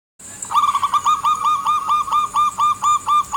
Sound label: Recording, bird